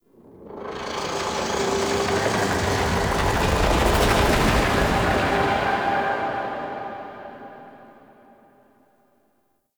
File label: Pulsating Whoosh; Warframe; Ambience; Entrati